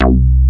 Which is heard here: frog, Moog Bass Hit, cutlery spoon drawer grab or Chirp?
Moog Bass Hit